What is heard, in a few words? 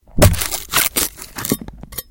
bone break stab